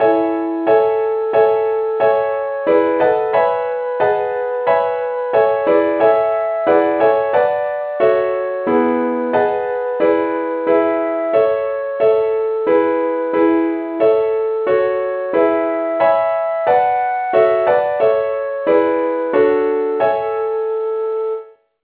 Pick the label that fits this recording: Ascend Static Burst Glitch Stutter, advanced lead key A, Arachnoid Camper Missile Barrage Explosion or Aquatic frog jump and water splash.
advanced lead key A